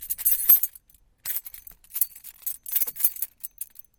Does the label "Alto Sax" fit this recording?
No